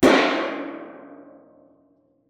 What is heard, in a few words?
hollow metal bang; soundscrate